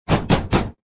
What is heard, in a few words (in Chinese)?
敲门声